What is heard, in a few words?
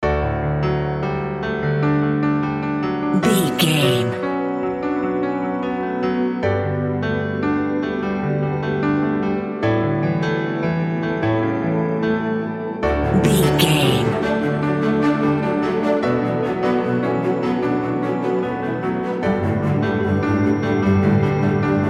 ahead narrative